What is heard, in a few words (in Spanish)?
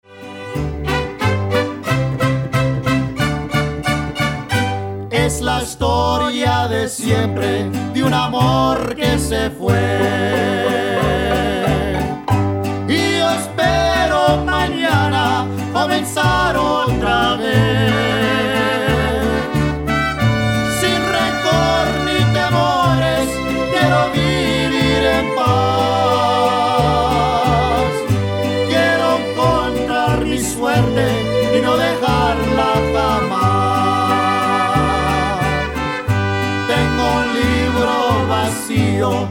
mariachi